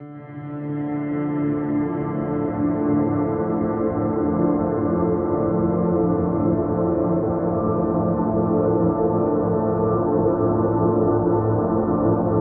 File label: Electronica Music Texture D Minor